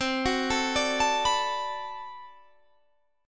B Minor 7th C, chord

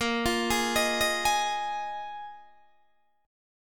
A sharp 7th Suspended 4th down Guitar DADGAD

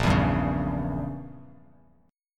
A sharp Diminished 7th, Bass Standard; strummed; JGuitar

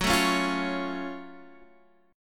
Gb Minor Major 7th, Guitar, Standard; strummed; x,9,7,6,6,9; JGuitar

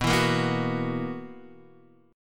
B Major 7th Suspended 4th B

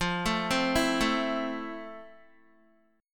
F Major 7th, Guitar; FM7; 12,12,12,9,9,x; 4F,4A,5C,5G,5C,6E; arpeggiated

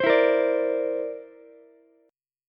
D 7th Suspended 2nd Sharp 5th, Ukulele; 17,16,18,17; strummed; JGuitar

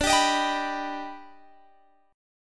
D sharp Augmented Major 7th D, chord

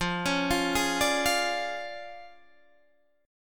D Minor Major 7th Flat 5th, F

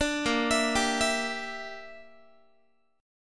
A sharp 6th Flat 5th, Guitar; A#6b5; arpeggiated

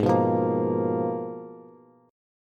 Ab Minor 6th Add 9th, Ab, Bass; strummed; JGuitar

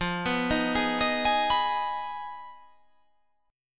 F Minor 7th Flat 5th

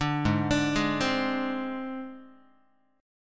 G sharp Major Flat 5th, Guitar

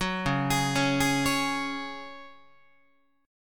C sharp Suspended 4th C sharp Guitar, chord; arpeggiated; 4A,4E,5A,5D,5G#,6C#; 9,9,11,11,12,12

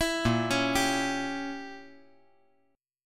A sharp Diminished 7th, chord

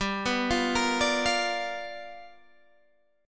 G 7th Sharp 9th G, Guitar DADGAD; 17,14,15,15,17,15; down

